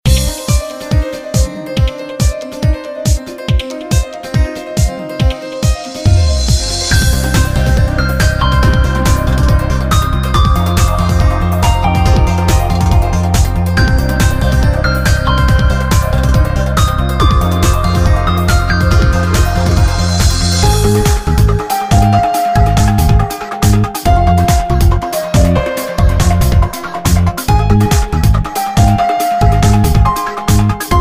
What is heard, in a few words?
Cave; Hell Girls